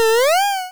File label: feather